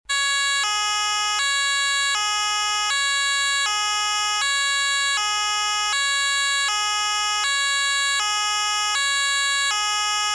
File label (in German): sigtech z5120 landsignal